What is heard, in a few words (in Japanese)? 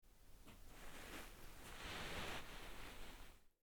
衣擦れ 右から左; 夏実の膝の上で衣擦れ